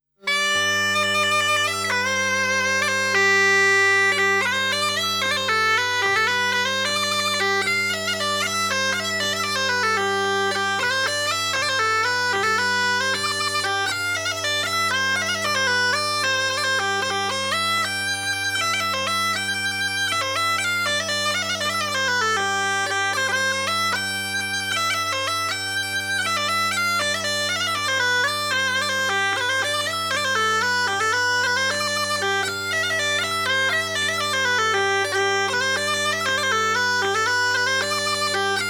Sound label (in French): Bourree du piano mecanique